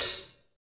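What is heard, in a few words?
hi hat; Best Service Gigapack 2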